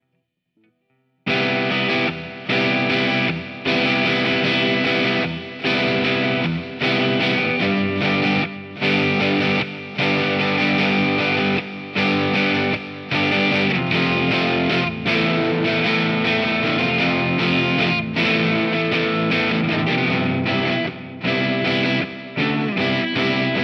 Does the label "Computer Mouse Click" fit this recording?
No